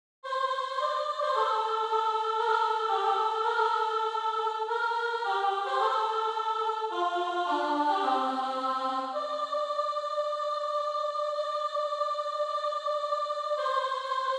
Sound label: toreador sop